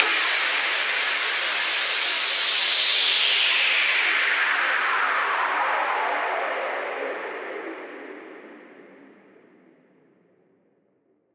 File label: Fx Impact; Fx Impact Utility